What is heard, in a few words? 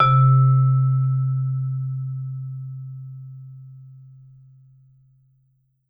VMED C2, VIBE MED MF; Marimba & Vibes; Sampleheads; Dave Samuels